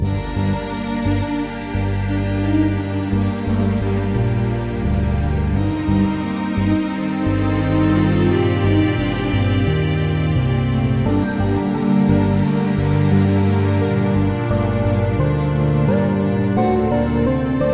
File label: comlink somber; Spiral Knights